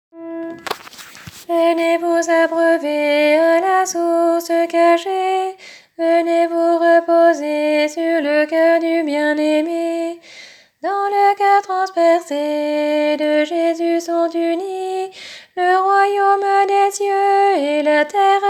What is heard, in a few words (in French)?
Venez vous abreuver Alto